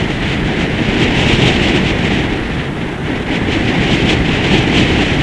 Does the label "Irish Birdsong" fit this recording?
No